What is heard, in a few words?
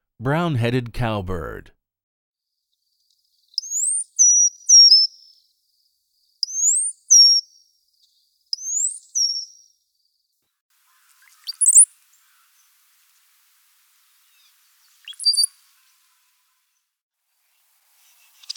Brown-Headed Cowbird, bird calls; Non-Game Birds